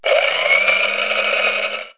Coffee Grinder; KABOOM! - Wild and Wacky Sound Software; Household; Kitchen

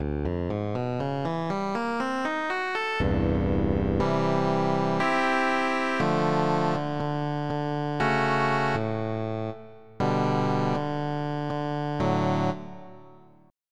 Fingered Bass

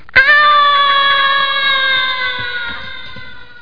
Aaah…; Bert 1.0a

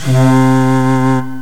shakuhachi; pipe

